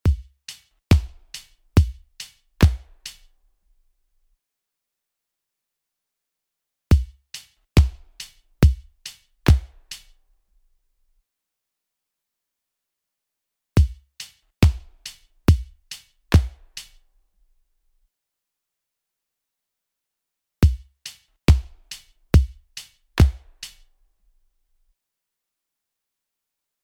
Rhythm Trainer 70bpm

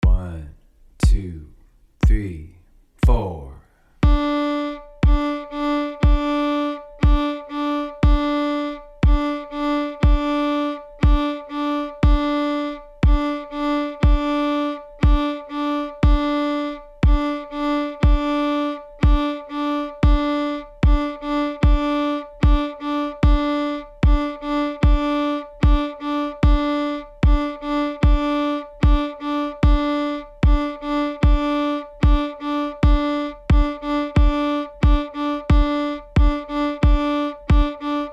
Hoedown D, fiddle

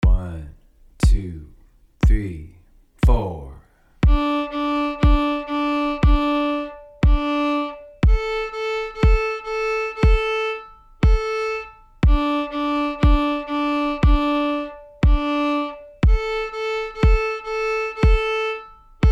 string crossing tucka D to A, violin

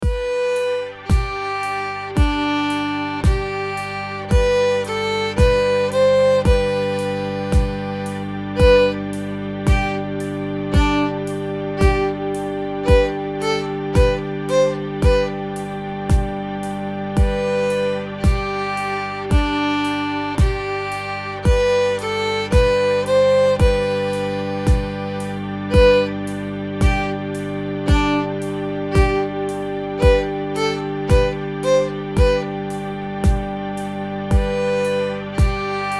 practice loops legato staccato, fiddle

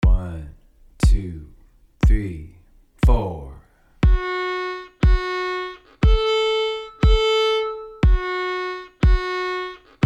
Intervals, fiddle

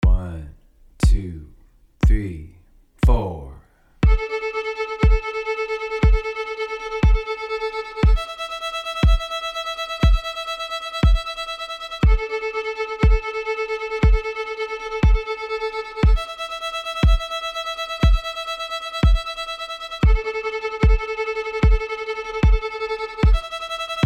string crossing tremolo A to E, fiddle

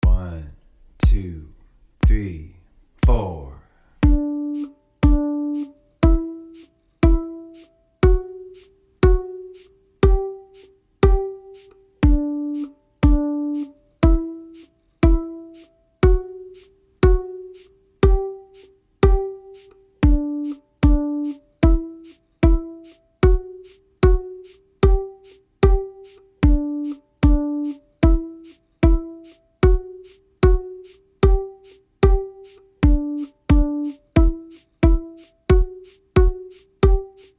two plucks, fiddle

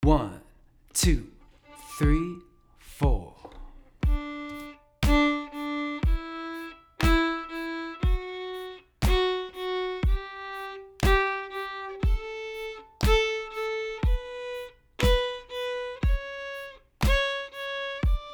offbeat accents hoedown d major, fiddle; offbeat accent patterns

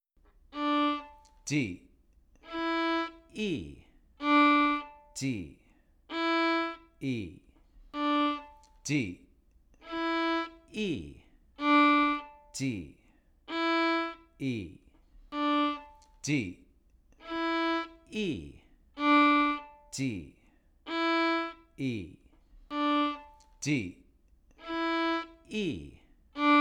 note name game, fiddle; d string

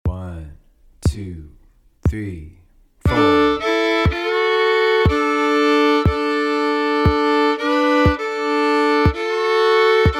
oh susannah in D adding double stops, fiddle; beginner fiddle lessons; getting plucky

